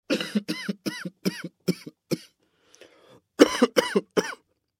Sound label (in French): toux homme